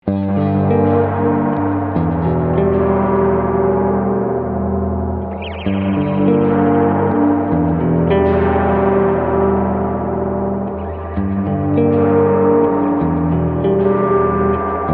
spring; BigSky; reverb; Mothra

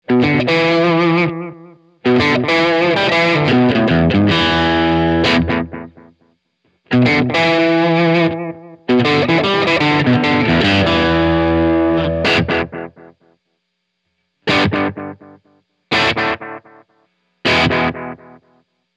No Modulation

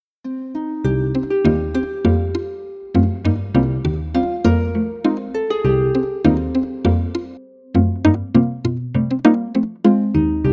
Plucked String Quartet; Hall machine set to Concert, Plate machine set to Small, and Non-Linear machine set to Gauss for the harp and melodica.

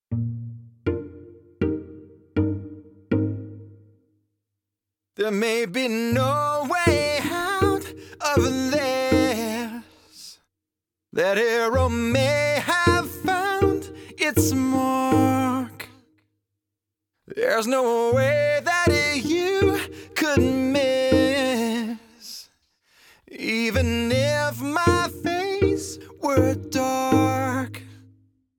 On Lead Vocals

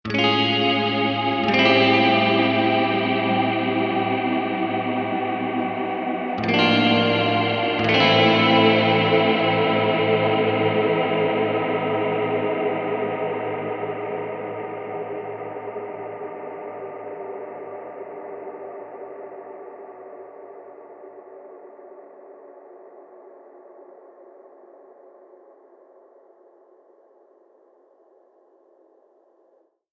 BigSky Falling Angel

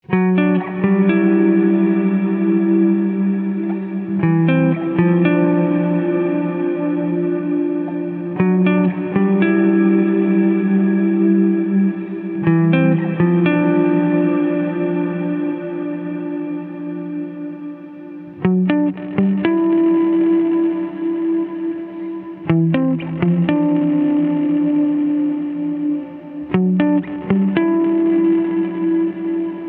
cloud; BigSky; reverb; Haze

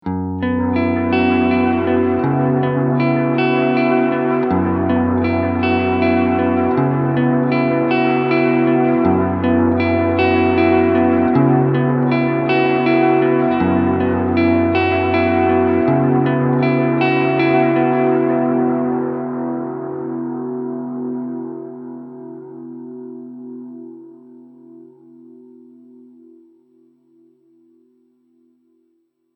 digital

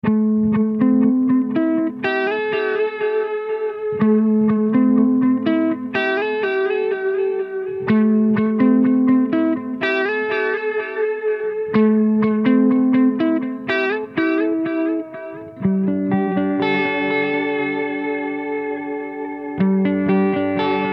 Fluttering Repeats; Strymon Compadre → Strymon Iridium → Strymon El Capistan

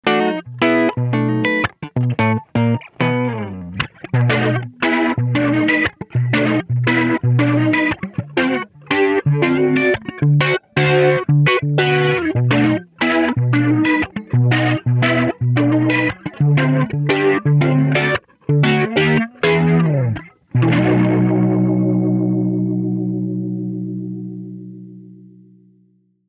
rotary; mobius

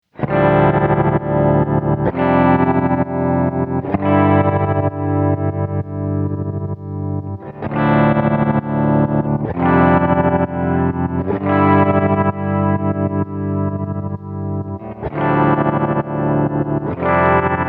pattern trem; mobius

